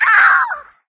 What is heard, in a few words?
death, infected female